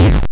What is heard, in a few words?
Alien Explode; Audition